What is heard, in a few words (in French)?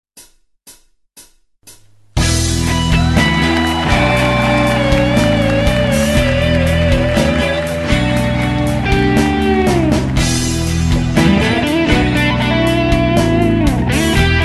Style pop; guitare